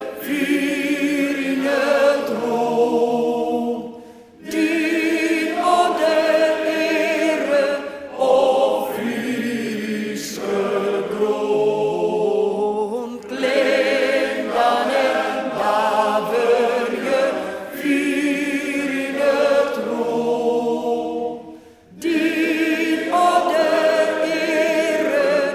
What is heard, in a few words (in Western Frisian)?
Frysk volksliet